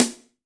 snare sample a sharp key, Loudest frequency: 4130Hz; snare drum samples